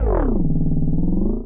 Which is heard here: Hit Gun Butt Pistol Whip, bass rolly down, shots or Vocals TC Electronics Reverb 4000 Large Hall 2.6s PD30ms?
bass rolly down